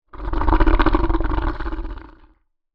Monster Yeti Snarl, Horror, Monster, Yeti, Snarl, Creature; Monsters; BlastWave